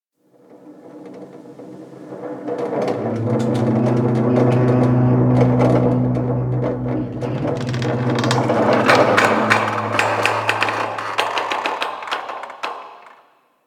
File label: Ship High Metal Creak; Warframe; Corpus Ship Remaster